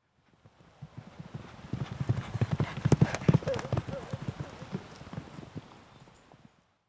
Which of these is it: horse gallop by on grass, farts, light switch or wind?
horse gallop by on grass